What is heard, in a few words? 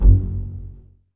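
bass pizzicato F#1, Virtuoso Strings; ILIO; Kirk Hunter Virtuoso Strings VOL-3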